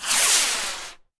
Rocket Snd Rocket; Go, Kizi, Go!

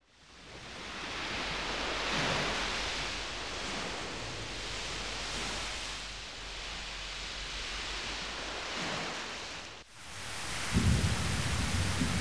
Storm; Valkyrie Profile; PS1

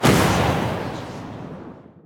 Magician circle explode

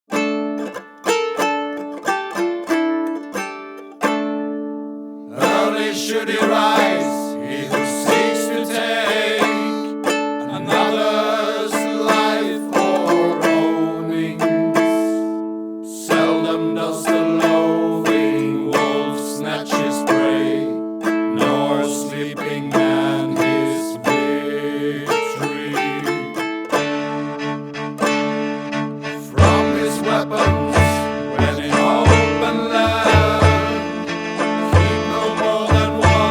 drum kitara chorus tagelharpa

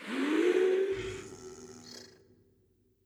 Rallying Horn

